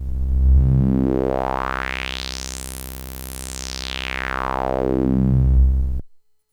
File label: Uno BP50R, Filter; unosynth